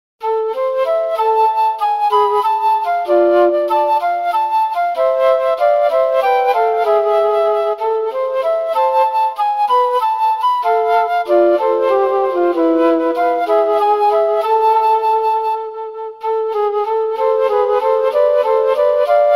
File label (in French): petit duo en la pour flutes